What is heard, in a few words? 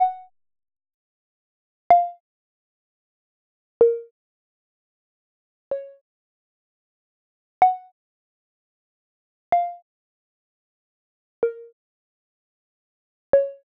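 Break Plucks 126 A minor Dry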